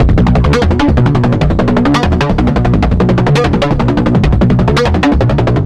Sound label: horde slayer, music; Left 4 Dead 2; Source Engine; zombat